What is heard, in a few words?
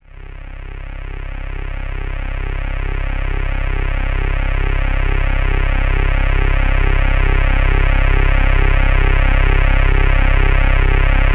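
PAD C2 right; USB Soundscan vol.05 - Explosive Jungle; AKAI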